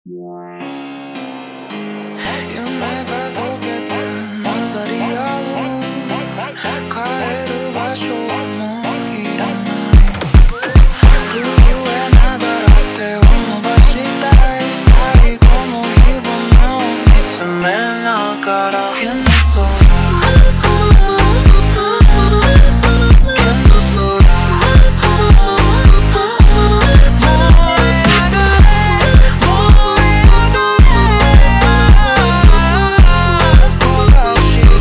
Montagem super Slowed

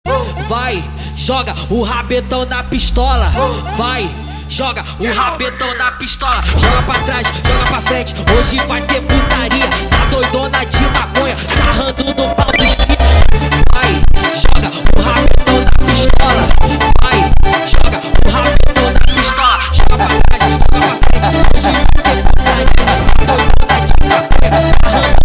Montagem sped Up

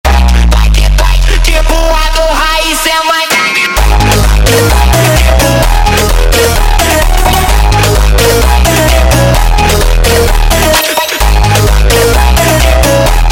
Montagem sped Up

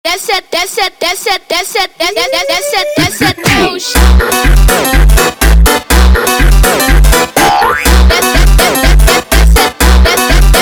Montagem

